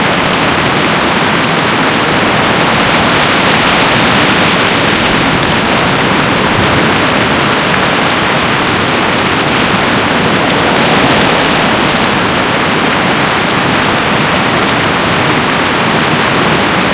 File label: Rain Heavy Loop